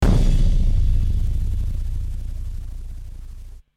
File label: Rhythm; PixelJunk Shooter 2; ps3